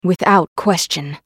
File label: attack; Akali